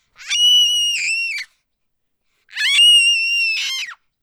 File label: screams